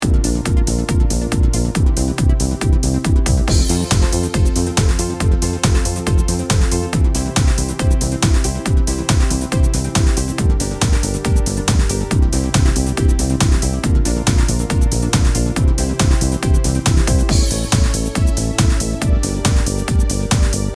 シェイカー Loop

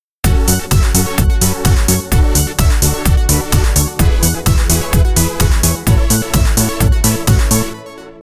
デモ曲（フル）

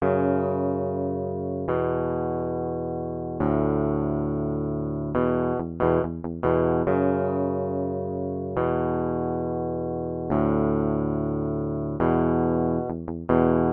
ギター音（アンプなし）

